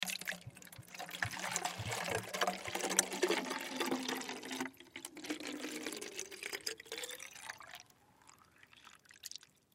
Spray Bottle Pour, Spray Bottle Pour Water In; Household; Spraying; Objects

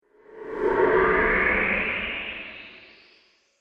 Teleport, science fiction

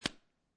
Foley, Chapstick, Remove Cap; Lid; Objects

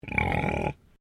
Animal Pig Landrace Grunt

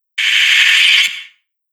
Sharpening Metal Whoosh

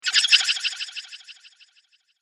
Cartoon Magic Spell

